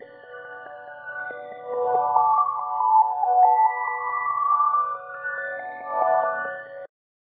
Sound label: atmospheric pad; wavefield